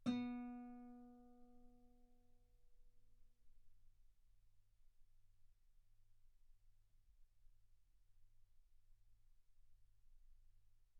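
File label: Guitar ff B string B3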